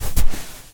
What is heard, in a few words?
footstep sand walk; ProSoundCollection v1 3 MONO Footsteps